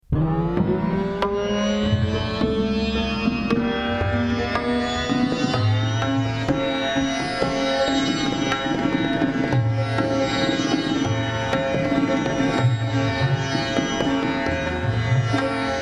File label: sitar